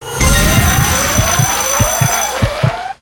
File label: mega win, slot; slot game; Hot 7